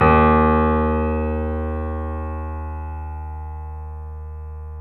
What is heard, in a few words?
3D Pianos